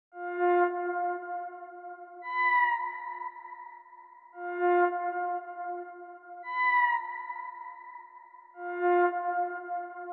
114 BPM D# Min Background Flute; Cymatics; 2022 Melody Collection Platinum Expansion

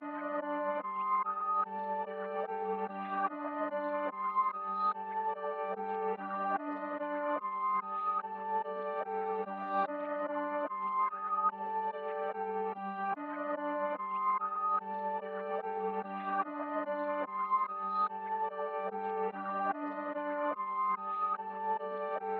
146 BPM G Min Reversed Piano; Melody; Loop Stems; Drill; Cymatics; 2022 Melody Collection - Drill; Meet The Reaper

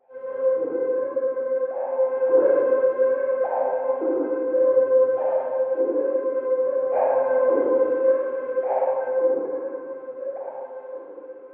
PAD (C); One Shots; Bubbles; Cymatics; Mystery Pack Vol 4

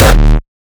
kick oneshot monster D; GhostHack

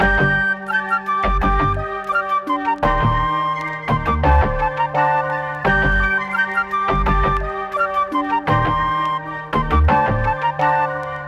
Melody Loop 170 BPM G Min, Hip Hop; Cymatics - 9God Hip Hop Sample Pack

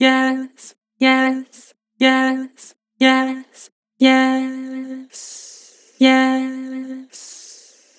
Yes, Vocals; Harmor

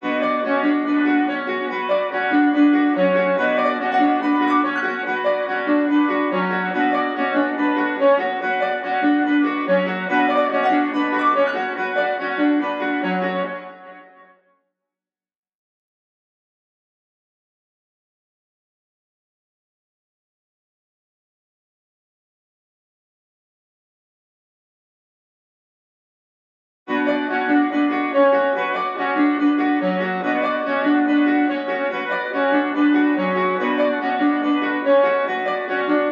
143 BPM F# Min Piano, Loop Stems; Melody; Cymatics; 2022 Melody USB Expansion; Haunted

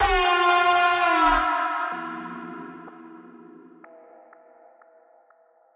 FX Cyberhorn

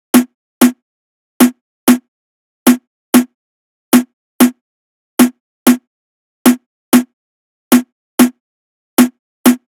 Drum Loop 95 BPM Snare, Hip Hop; Cymatics; Perro Drum Loop

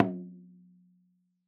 Bodhran, World Percussion; Cymatics; Emperor World Percussion

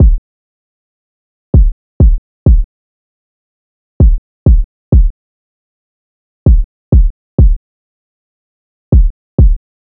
Drum Loop - 195 BPM Kick; Loop Stems; Cymatics; Behind Drum Loop

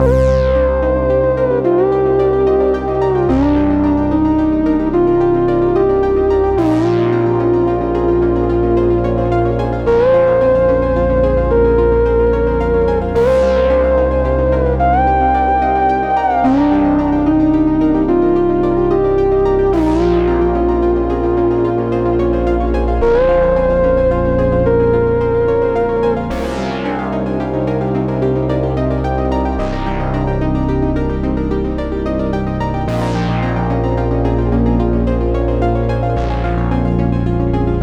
73 BPM C Min, Melody Loops, Retro Collection; Mainframe

